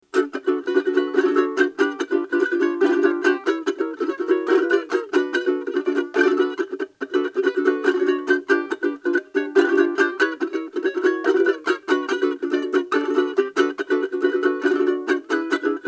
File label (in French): ukulele exemple resonateur